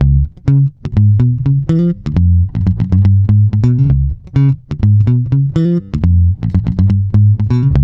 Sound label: BOOG C#, Bass, BOOGALOO; Spectrasonics; Bass Legends